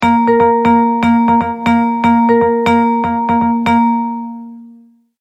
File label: Multimedia Tone-Thinking/Processing